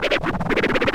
FX SCRA, Scratchin; Club-50 - Foundations Roland; Scratch 1 S

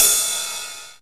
909 RIDE

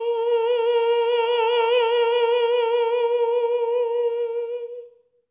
TENOR A#4; Spectrasonics; Symphony of Voices

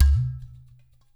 udu; InVision Interactive; GRUNT

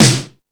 Rock N Roll, Drum Machines

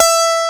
E4 STRAT, BassGuitar; EL GT; AKAI S6000 CD-ROM - Volume 1

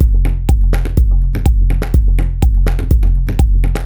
F# 124bpm, House Drumloops; Zero-G House Fabrik Drumloops

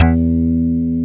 PULL BAS; Synclavier Sampler Library; KEYS AND GUITARS

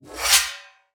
SPINET; Xsample VOL-7

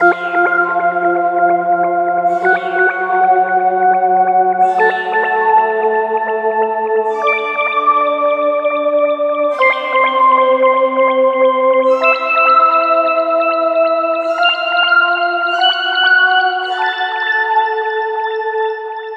Synth Pad; Trip-Hop; Loops; Sonic Foundry (Sony Creative Software); Rondo Brothers Trip-Hop Loops & Samples; El Corazon

